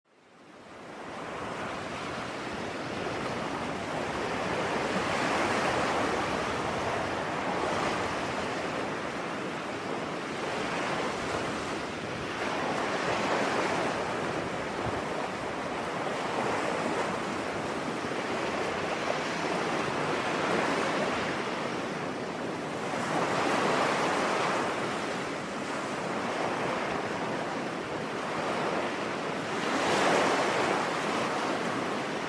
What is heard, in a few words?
ocean